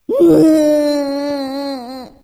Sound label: BEARTANG Cry, game; Eft